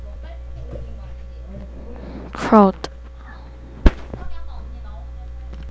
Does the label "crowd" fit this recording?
Yes